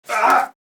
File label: Ahh